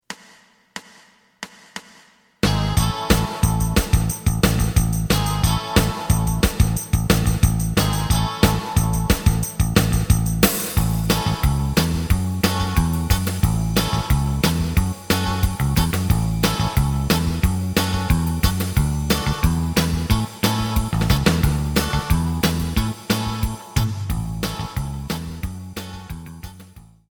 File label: lead; エレキバンド用カラオケ